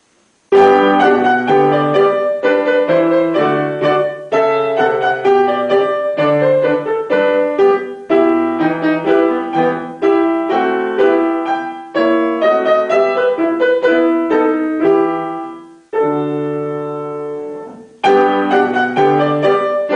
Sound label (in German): Russische Melodie, klavier; 4-händig